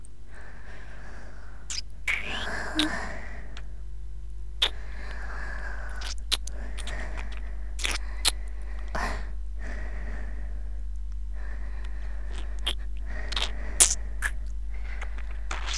お姉ちゃんのフェラチオ＆吐息; 凛お姉ちゃん

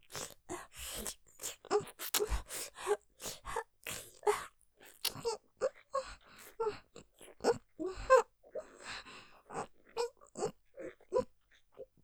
フェラ音マシマシ 切なそうに・気持ちいい; おかずにスパイス; 後乗せエロエロ

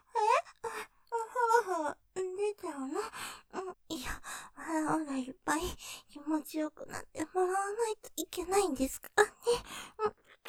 フェラ そろそろでちゃう; ステレオ

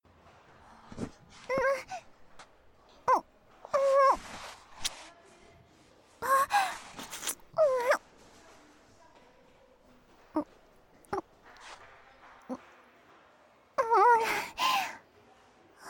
キス → スカート

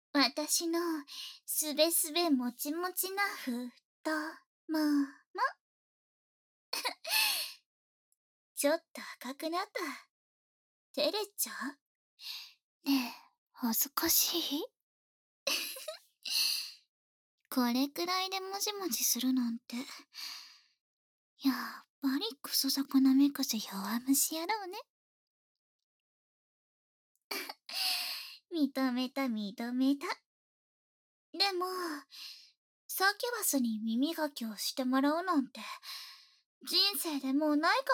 耳かき実験よ！ 右

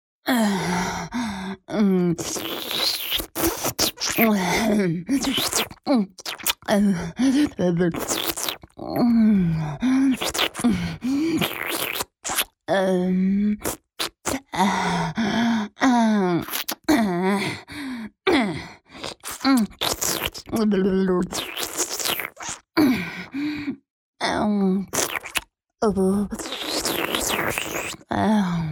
フェラチオ(中):包皮と亀頭の隙間に舌をこじ入れて舐める

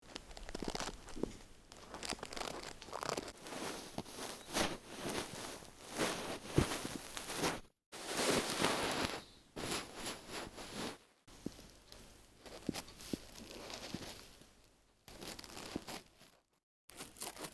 耳かき R (耳鍼モデル + 塩 + 耳かき + 梵天); 夏実の耳かき15

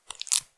くちゅ音単発

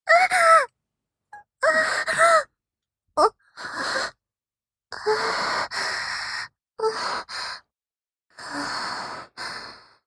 絶頂後の息遣い; 実用ボイス抜粋集

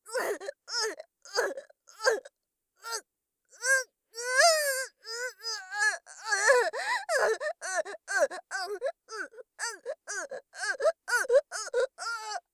"う゛っ"; 効果音なし

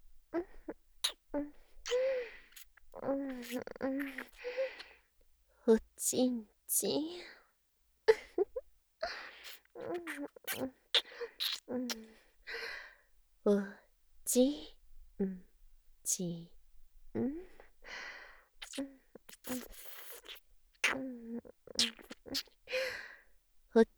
おち○ちん キス

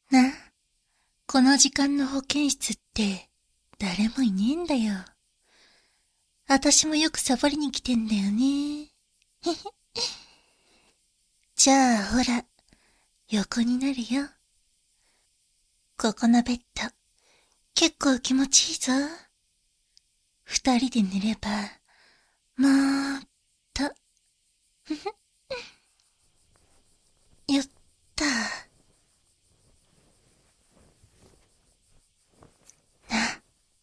耳舐め 手コキ（環境音あり）; 保健室で耳舐め手コキ（環境音あり） 左

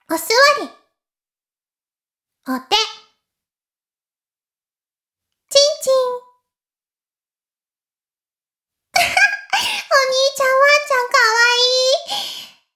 しこしこ音声, ASMR; おにいちゃんアイスぅー！

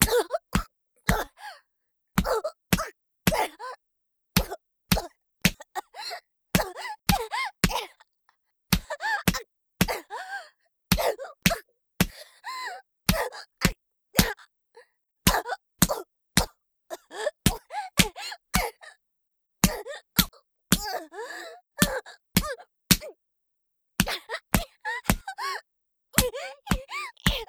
ストレート(威力高め) BPM110 ワンツースリー, 効果音あり, ASMR

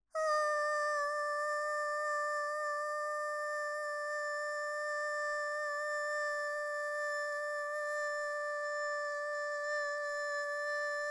あーーーーーーー

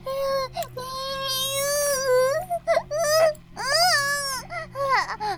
バイブ放置; イクたびにわけて

